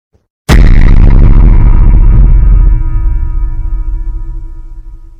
low honour bass boosted; rdr2

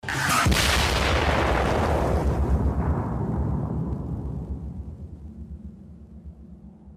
explosion; youjo senki

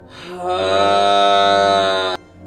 euughgghhghghghh

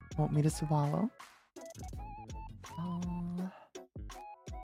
swallow

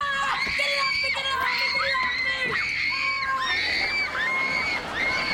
screaming

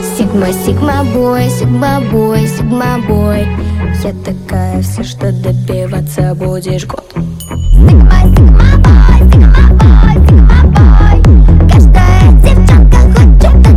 more bass

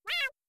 meow; survivor

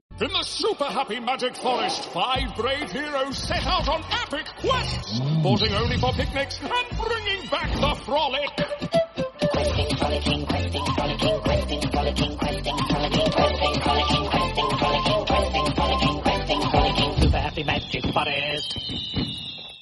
theme song; super happy magic forest

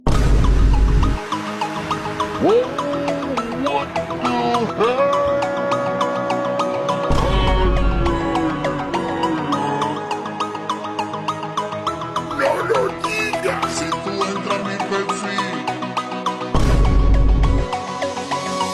ahh sound

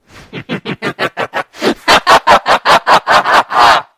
evil sounds laugh

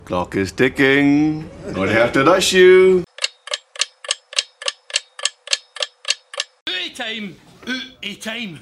clock; still game

